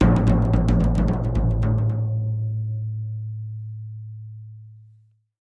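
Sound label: Drums Toms C Low Stereo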